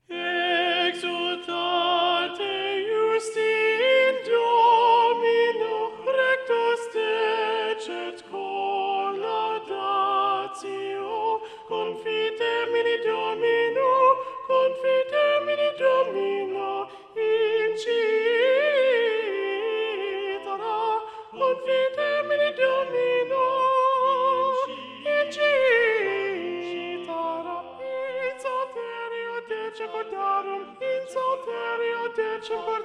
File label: exultate justi satb soprano predominant; viadana